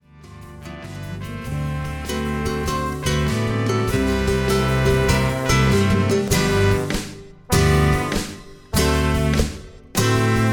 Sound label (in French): version accompagnement